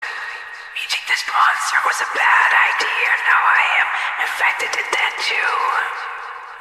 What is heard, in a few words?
horror mode party club